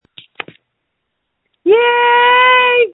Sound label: scream